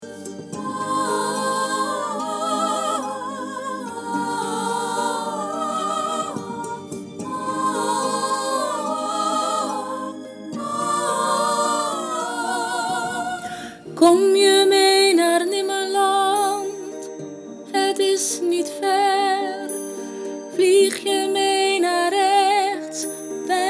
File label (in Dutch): Proloog stem MIDDEN